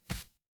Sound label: paper fabric fabric